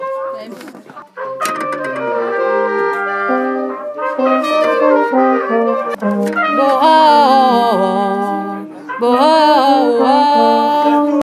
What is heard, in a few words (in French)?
Trompette recrée